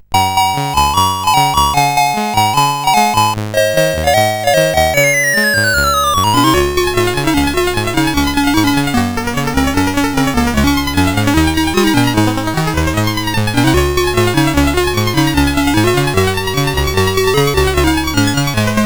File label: Music, CSG8580R5; Colleen Music Compendium